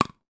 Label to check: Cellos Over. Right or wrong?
Wrong